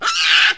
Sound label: baboon sounds